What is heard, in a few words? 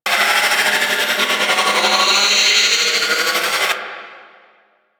specter capture loop; Teshin; Warframe; Ambience; The War Within